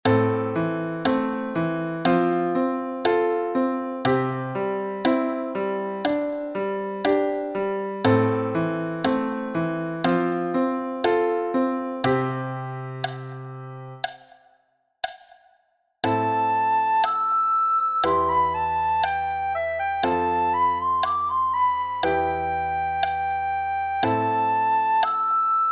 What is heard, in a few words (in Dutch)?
sopraan; Blokfluitles in Utrecht